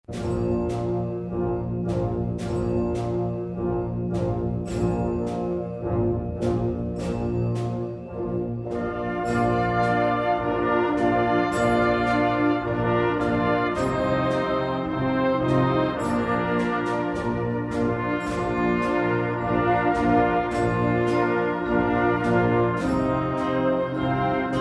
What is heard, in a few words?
Christmas Carol